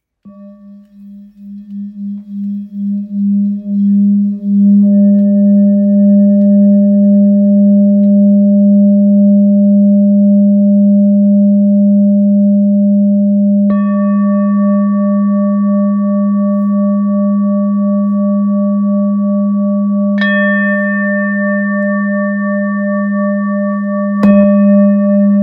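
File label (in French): Bol full moon rose sol, bol chantant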